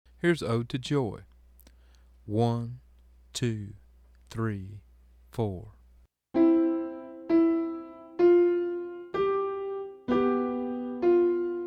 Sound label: Grand Staff Slow